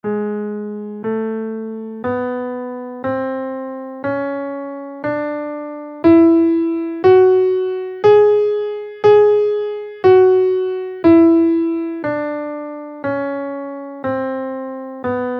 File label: 8 tone spanish scale in Ab, guitar